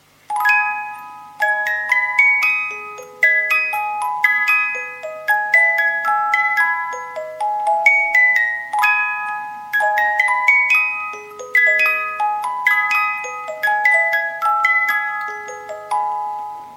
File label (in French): boite a musique